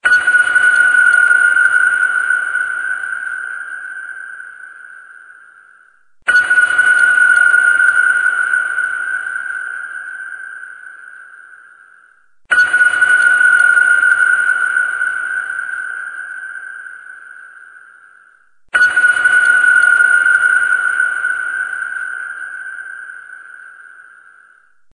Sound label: ping sonar